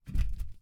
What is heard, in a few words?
FOOTSTEP - Metal Grill Jump Barefoot Male; Barefoot on Metal; Timothy McHugh; GDC Game Audio Bundle